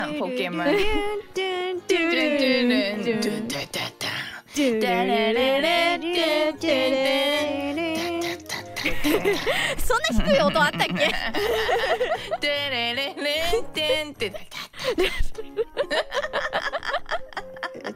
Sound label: singing BGM; IRyS Lui Kronii; Pokemon Center BGM